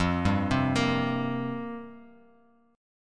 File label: F Suspended 2nd Suspended 4th F down Bass Standard; arpeggiated; JGuitar